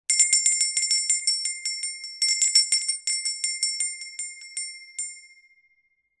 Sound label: BELL DOOR